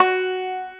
BANJO F#4, ETHNIC; AKAI S-Series CD-ROM Sound Library VOL-8

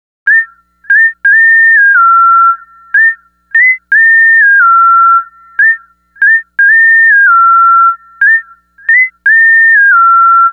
SYNTH LEAD; Keyboards; Leads

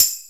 TAMB; E-MU Formula 4000 Series Vol. 1; Hip Hop Nation

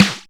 Flange Snares; Best Service ProSamples vol.37; Dance Synths; Percussion

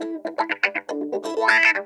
box wah, funk guitar; Zero G - Funk Guitar

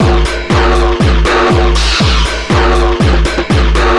Noise Beat; Drums & Drones

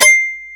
DS8 C 3, Korg; Back In Time Records; Korg Universe VOL-1

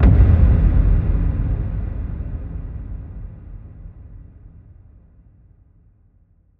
Impact; impact samples

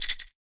PEYOTE; AKAI S6000 CD-ROM - WorldSounds

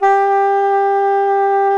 G3, tarogato; SoundBytes - Early Patches

